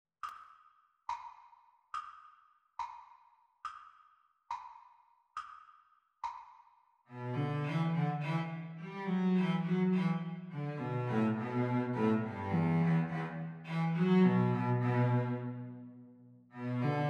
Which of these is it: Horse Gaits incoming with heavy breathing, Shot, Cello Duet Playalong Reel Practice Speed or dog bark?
Cello Duet Playalong Reel Practice Speed